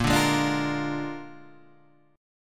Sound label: A sharp 7th A sharp